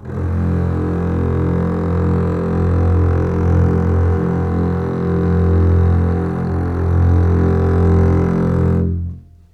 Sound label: F1 LEG F, String; Ultimate String Collection